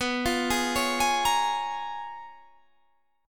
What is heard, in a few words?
Db 13th B, guitar